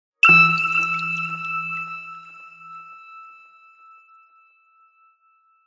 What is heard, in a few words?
multimedia game sound calm soft mellow watery ping